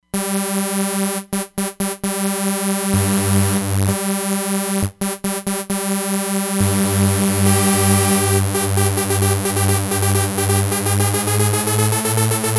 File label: Roland Fantom XR; Pressyn